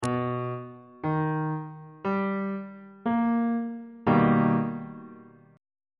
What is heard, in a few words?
c flat augmented major 7th chord